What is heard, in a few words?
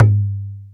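R8 percussion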